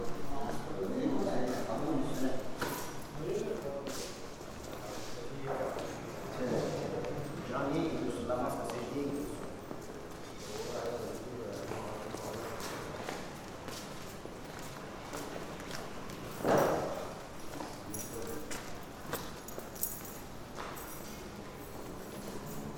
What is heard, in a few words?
Entrance To The Mosque On Rhodes Speaking Arabic Scooter